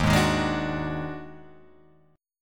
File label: Eb Minor Major 7th Double Flat 5th, Guitar, Open G